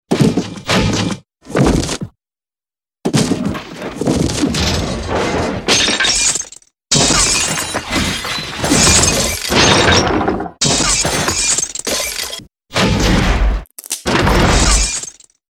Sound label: destruction and body impact seq